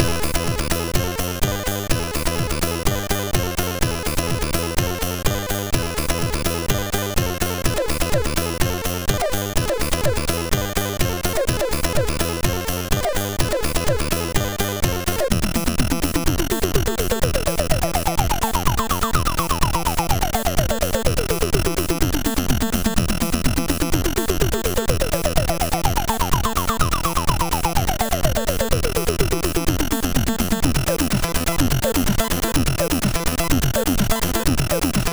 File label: Crazy Techno sid MOS6581R4